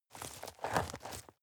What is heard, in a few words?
step dirt heavy boots scuff short; menschen; schritte